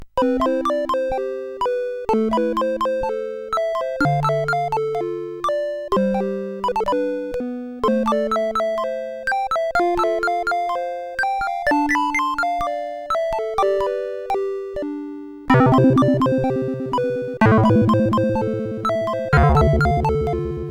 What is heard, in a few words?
tune, MOS6581R4